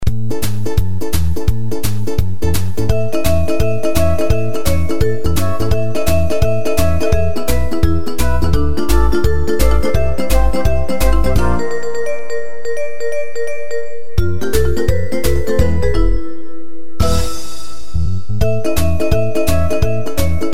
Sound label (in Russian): Песенка минусовка; Винни-Пуха